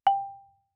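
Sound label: Marimba cord fortissimo G4; MIS Pitches - 2014; Percussion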